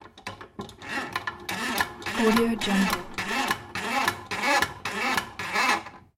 old windup telephone

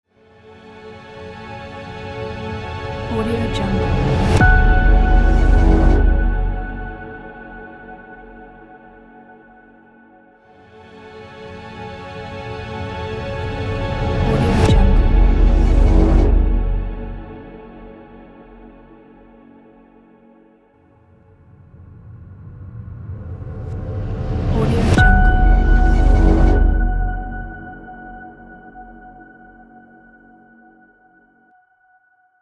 cinematic short logo; sadcinema

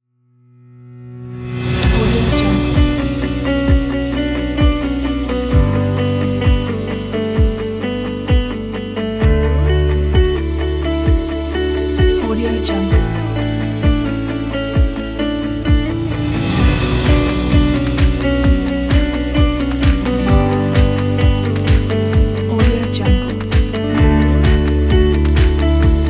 energetic upbeat uplifting summer folk

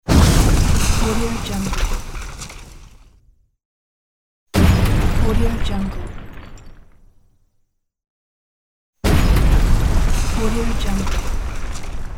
bomb blast sounds; soundall

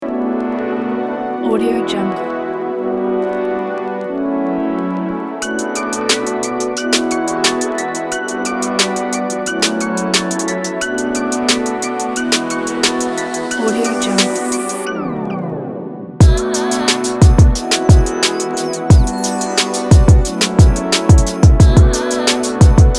for youtube hip hop vlog intro

